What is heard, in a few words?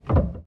piano release